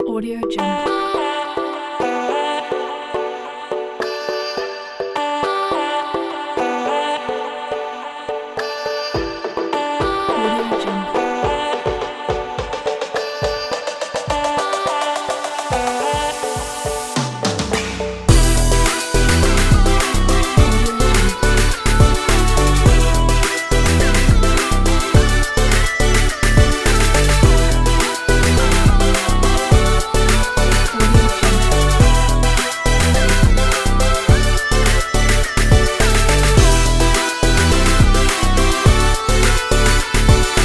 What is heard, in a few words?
future bass inspiration